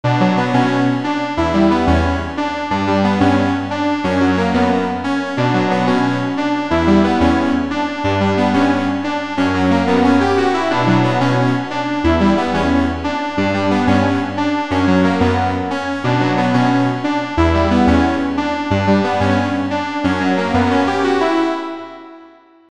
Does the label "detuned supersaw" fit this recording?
Yes